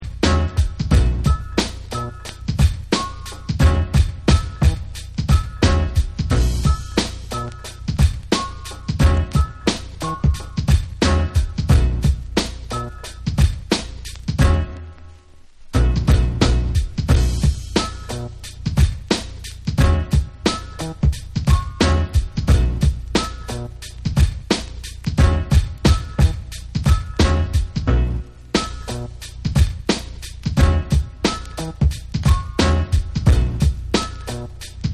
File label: Instrumental